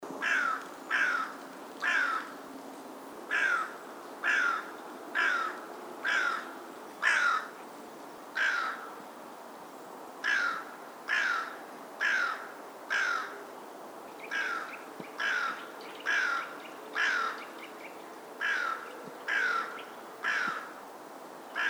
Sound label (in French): corneille noire appel